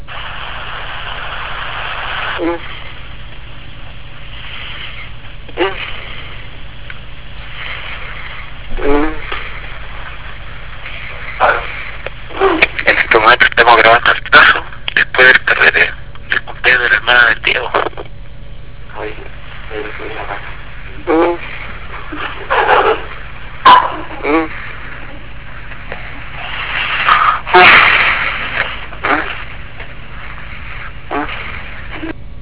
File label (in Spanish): gemidos